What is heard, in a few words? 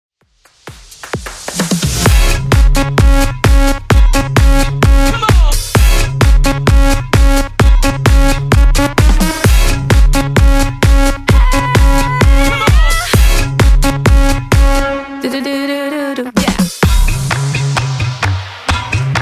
Top40 Simple Redrum 130bpm